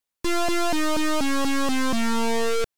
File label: Good Luck Beeper; Split Personalities